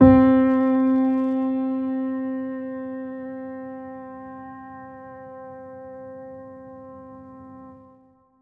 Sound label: Piano C, Lo-Fi; Melody Kits; One Shot; Ghosthack; Memories 78 Em; HipHop